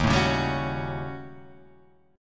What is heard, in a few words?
Eb 7th Sharp 9th Flat 5th Eb; strummed; 11,12,11,12,13,13,x,x